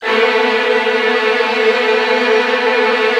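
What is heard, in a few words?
Virtuoso Strings, violin trills, A1; ILIO; Kirk Hunter Virtuoso Strings VOL-1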